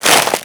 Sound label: Hammer Pull; Warframe; Grineer Hammer Boss; Foley